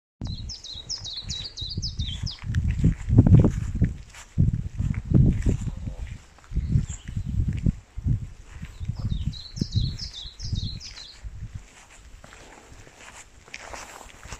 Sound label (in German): fitis